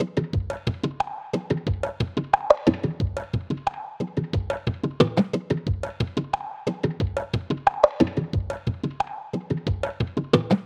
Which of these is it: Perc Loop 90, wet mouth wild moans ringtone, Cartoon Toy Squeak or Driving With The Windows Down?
Perc Loop 90